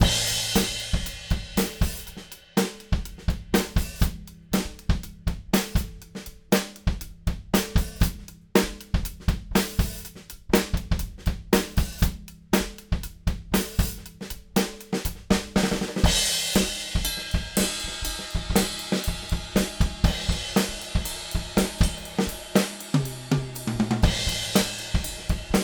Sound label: Rode NT5 Beta52 SM57 btm, overhead mit bd snare; mikros